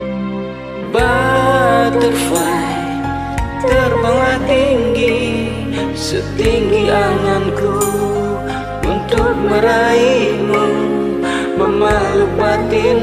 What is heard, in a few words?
march